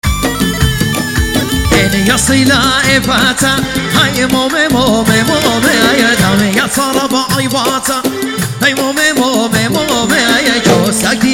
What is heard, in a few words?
assyrian song